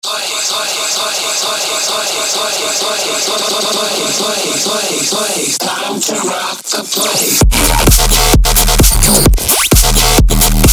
CLUB EDM; loopmasters

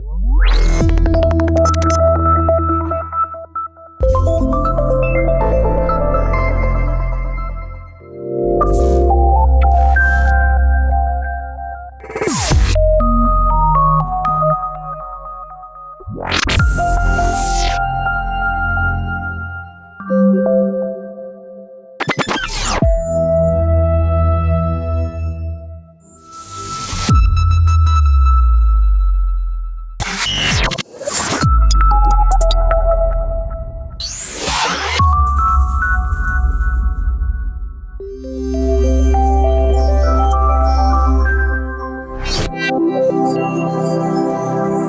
Futuristic Branding IDs; sfxtools

